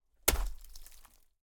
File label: CROWBAR HIT DEBRIS, Ice